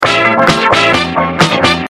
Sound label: Funky Filter